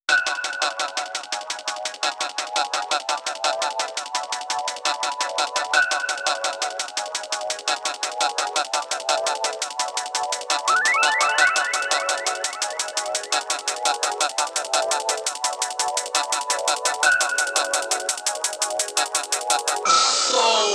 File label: siren wob, breaks